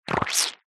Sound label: cartoon squelch